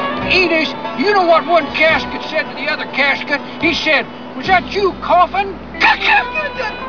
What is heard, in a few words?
casket